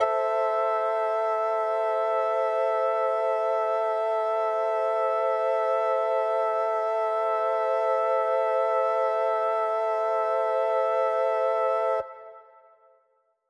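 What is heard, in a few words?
Mixed Winds A minor, synth orchestra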